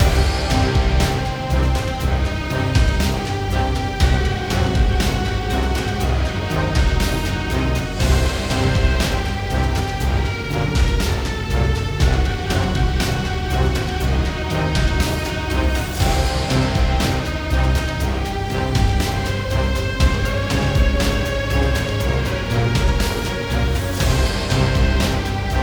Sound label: Final Boss Music Fight; Robbie Swifthand and the Orb of Mysteries; PS4